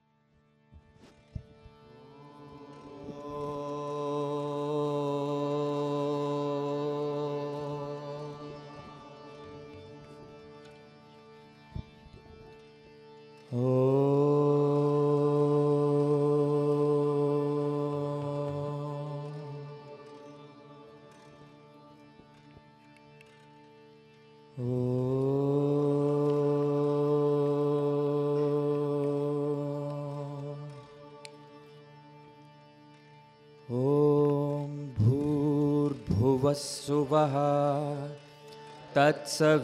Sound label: Gayatri Mantra, Bhajans; Sailent Retreat April 2007; Saturday AM